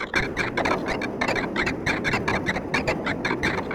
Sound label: glitch